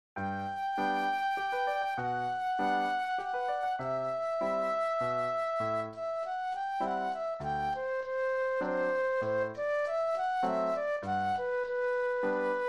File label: Waltz Flute Solo; classical; Puccini; Musettas